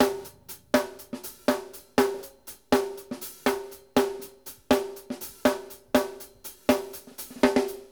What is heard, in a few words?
hands D, drumworks